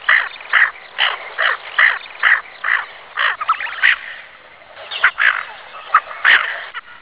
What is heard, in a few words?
aythya fuligula